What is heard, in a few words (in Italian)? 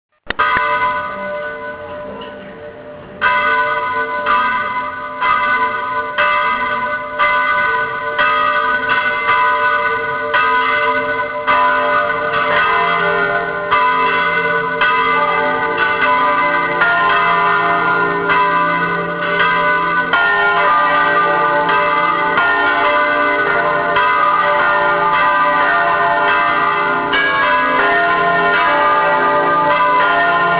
campane festa grande